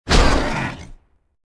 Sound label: monster kill tap